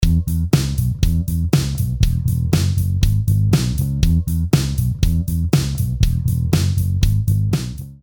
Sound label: Snare